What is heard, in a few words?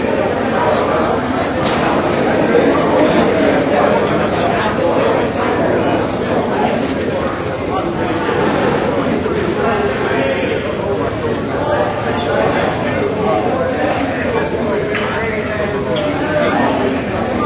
appartments crowd loop; Source Engine; video game